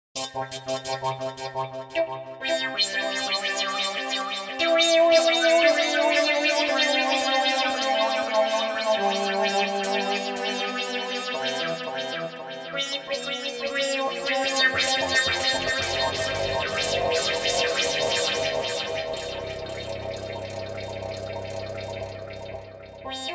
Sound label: String Studio VS3